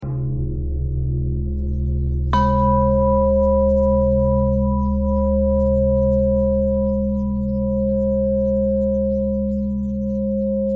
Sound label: bell thread pentatonic